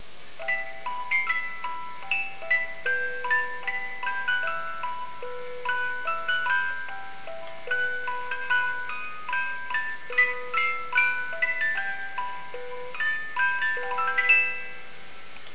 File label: musicbox